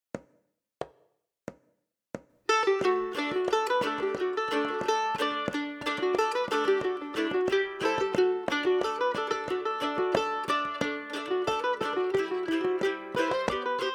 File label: Chorus Jig